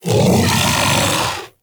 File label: Kubrow Armored Vocal Alerted; Warframe